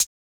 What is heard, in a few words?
Closed Hats